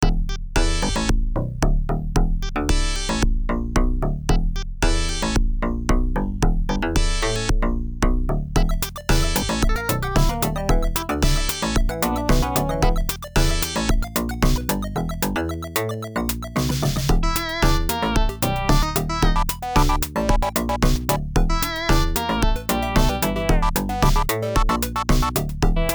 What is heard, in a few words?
funk modulator; weeklybeats-2020